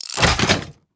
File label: Parts New